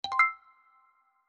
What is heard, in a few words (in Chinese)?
金蛋出现; goldegg